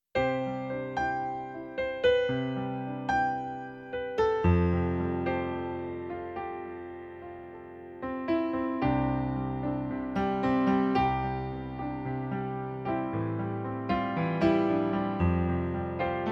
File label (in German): Playback, pianoplayback